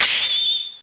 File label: fwasshing